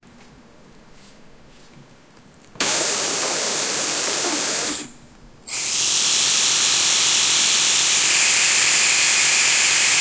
lazer activation

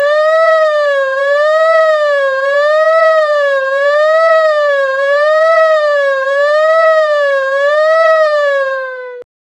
drama siren; 2 seconds

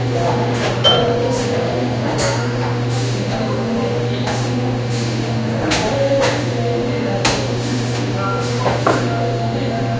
cozy coffee shop ambience, cafe

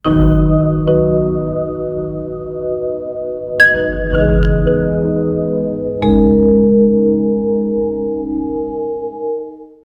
warm nostalgia melody, bell tone

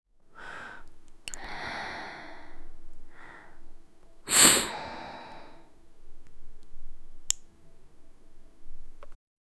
create the sound of a, smoking

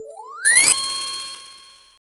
ludo game reset sound wit, mobile game

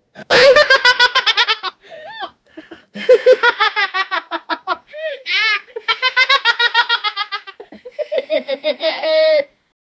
11 year old african, laughing

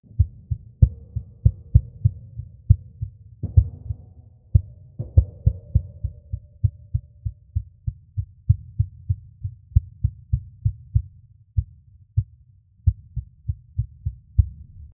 horror the sound of a, background music

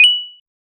a short slightly brighter, metallic tap

